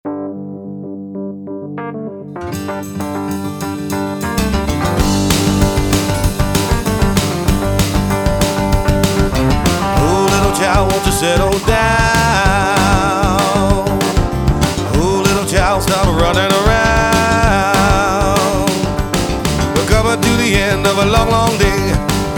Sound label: Rock To Sleep